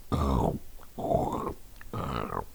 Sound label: chomp